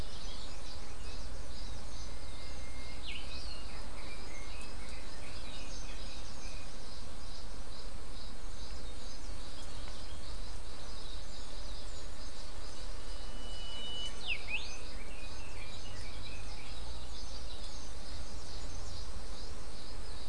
mixture of bird and insect

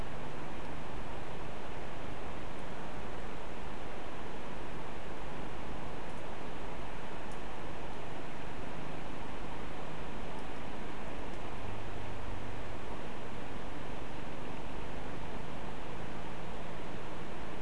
no obvious biophony

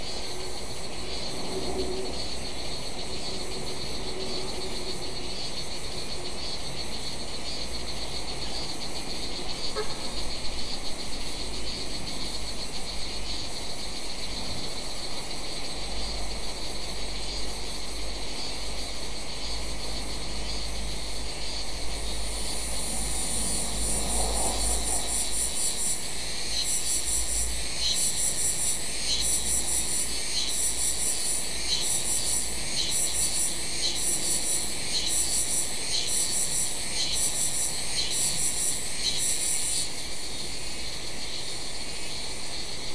mainly insect cluster

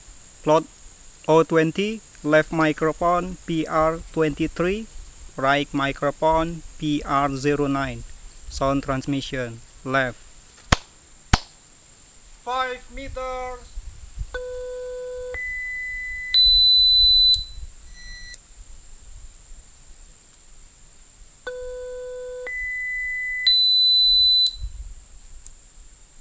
birds

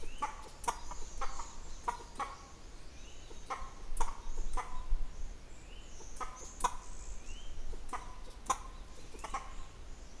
Sifak Propithecus verreauxi kirindy

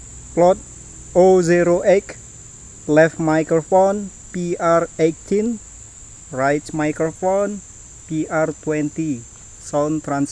birds

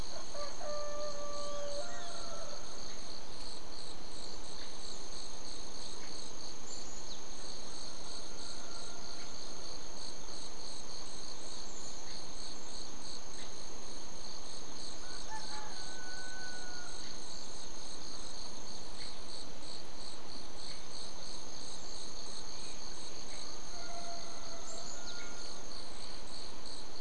mixture of bird and insect

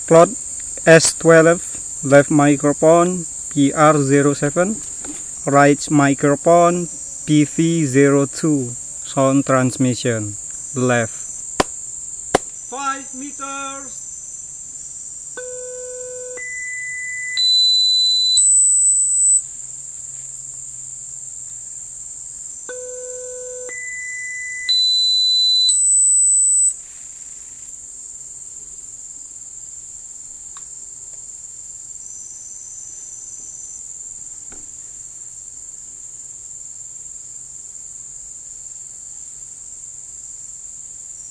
birds

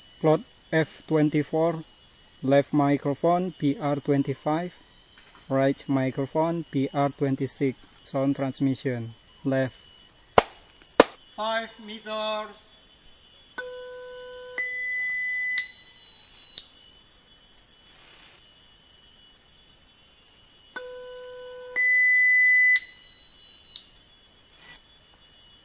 birds; ecoSound-web

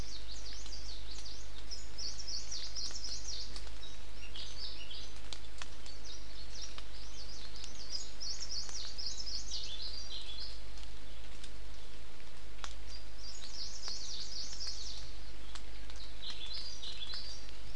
mixture of bird and rain

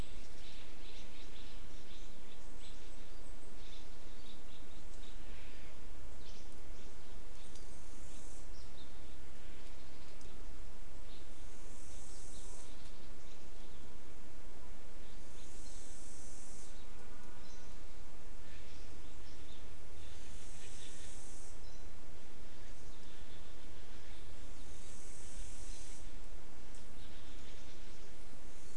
mixture of bird and insect

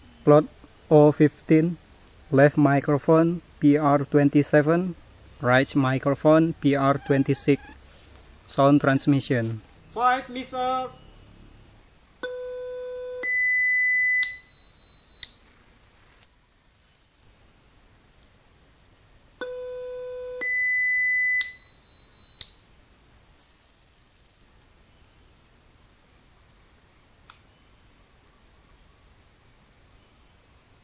birds

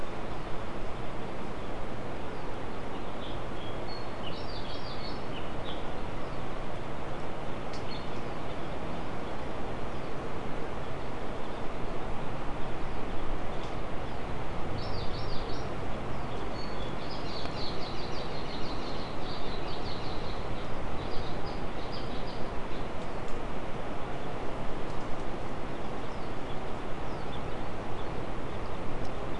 mainly bird cluster

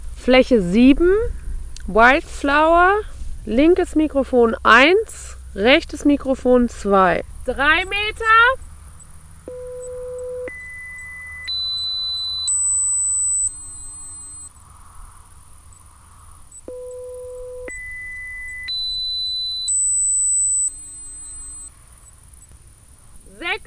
birds

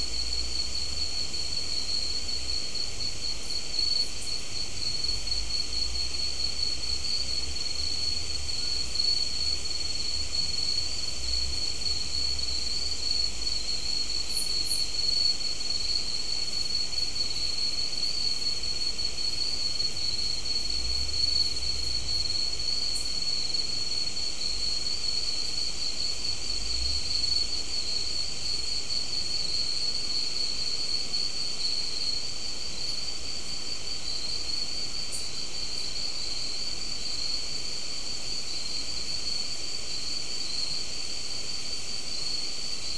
mainly insect cluster

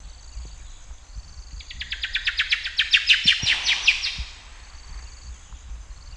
Presbytis melalophos

